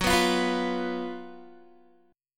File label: Gb Major 7th Suspended 4th Gb Guitar